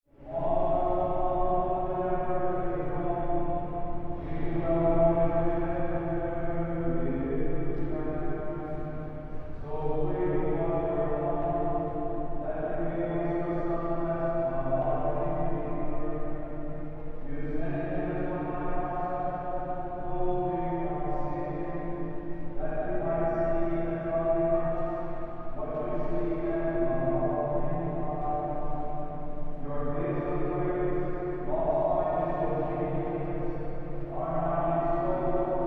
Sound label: church service less congregation